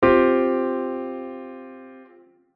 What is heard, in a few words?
Csus4